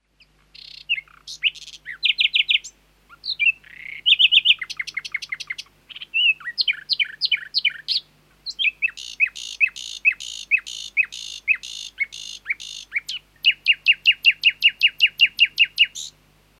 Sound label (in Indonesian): nightingale untuk kenari isian